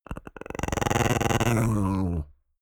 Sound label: chihuahua growl creak